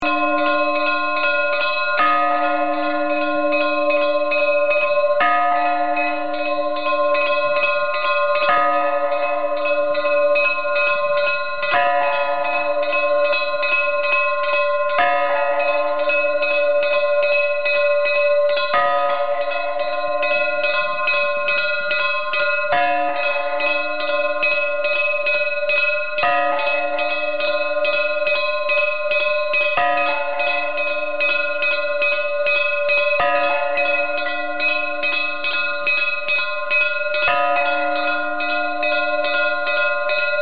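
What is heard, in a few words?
kolokol zvon, bell ringing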